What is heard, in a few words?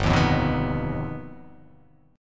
G Augmented 7th; G+7 strummed; 3,6,3,4,3,6,x,x; JGuitar